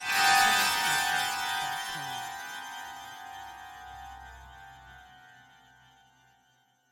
horror haunting piano hit; soundscrate

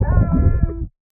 underwater scream male bright; soundscrate

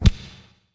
anime punch

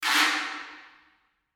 fish shaker

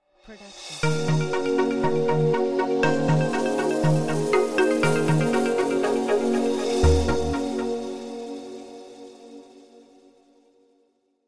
Corporate Ident Company Logo; SoundsCrate

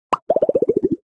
Stylized Bubble Pops Descending; SoundsCrate

